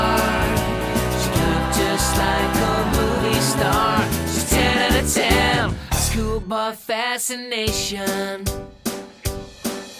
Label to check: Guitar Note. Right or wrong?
Wrong